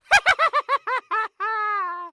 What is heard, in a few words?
Cartoon Human Male Evil Witch Laugh